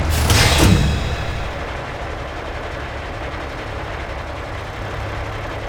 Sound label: apc stop then idle loop; vehicles; Counter Strike Model Pack; CS:GO